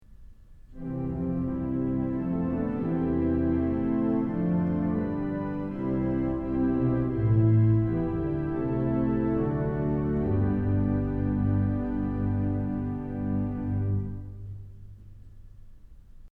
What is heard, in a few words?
celestes, organ; Kellogg Auditorium